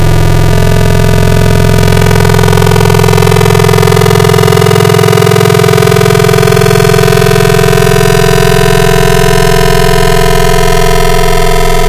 Triangle Morph; Synthetic's Serum Collection; Cymatics - FM Wavetables Vol 3

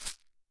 shaker rice; Attacks Misc

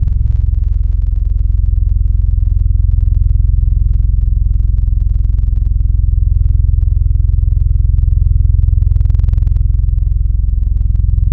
Trumpeter; Instruments; Synthetic's Serum Collection; Echo Sound Works Core Tables

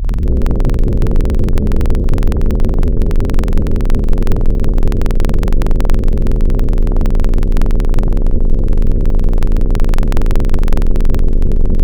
Drop A Guitar, Wavetables; Ambitronic

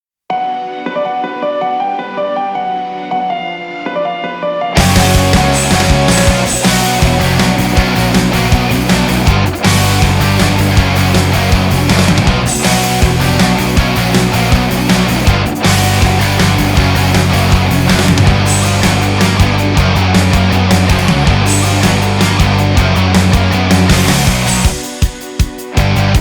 off vocal; Touhou

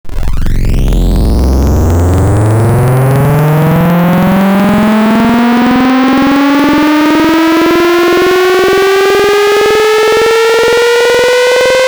Sin, Serum Wavetables; Synthetic's Serum Collection; Cymatics - Nuclear for Xfer Serum Wavetables

